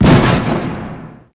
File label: Impact